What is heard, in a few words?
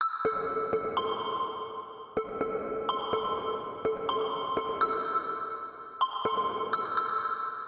Plinkage